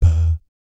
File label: BAP E2, Jazz; ILIO; Jazz & FX; JAZZCOMBO